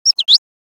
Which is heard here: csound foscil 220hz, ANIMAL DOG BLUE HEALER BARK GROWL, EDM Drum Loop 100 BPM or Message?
Message